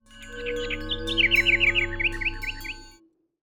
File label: Temple, complete; 0AD